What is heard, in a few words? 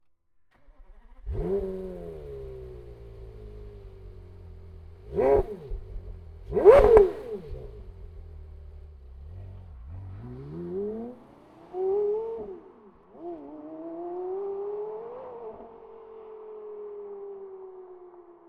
Porsche Carrera GT